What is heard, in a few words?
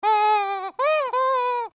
squeaking horn